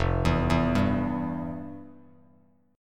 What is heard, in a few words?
F Major 7th Suspended 2nd, Bass Standard; arpeggiated; JGuitar